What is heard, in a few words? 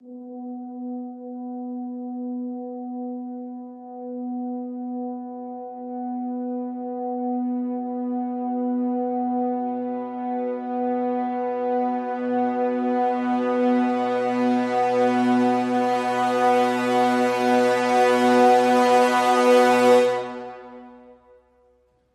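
Crescendo Brass Swell Hi (B)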